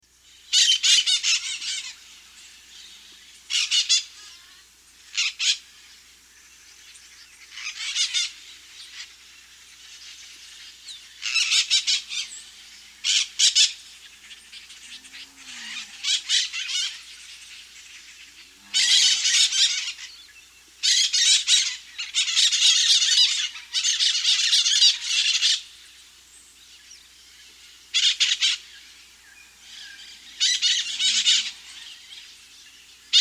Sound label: Crimson-Fronted Parakeet, Nature's Songs, S. America